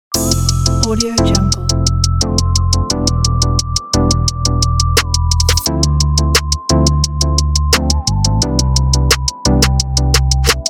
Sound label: hip hop lounge; mark-music